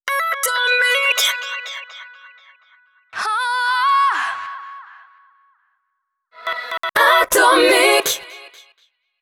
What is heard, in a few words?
JINGLE acap; ATOMIC; HABILLAGE ACAP